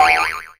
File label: boing; Flashpoint Ultimate 13; super-santa-kicker